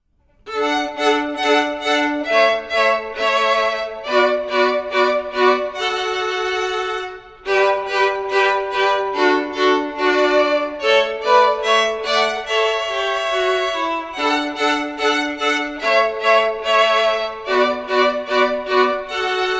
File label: Jolly Old St. Nicholas Violin; Community School of the Arts